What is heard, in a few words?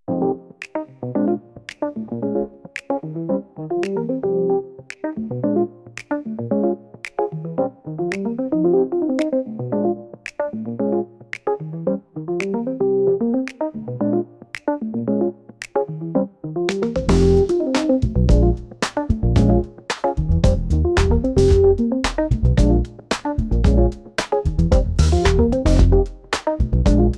Instrumental